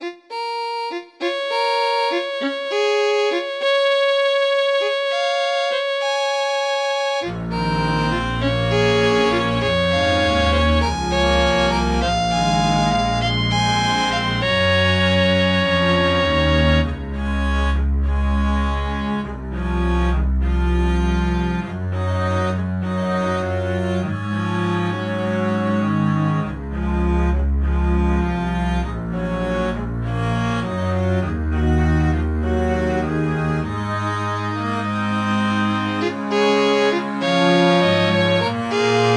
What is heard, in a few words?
Unfinished Quartet or Quintet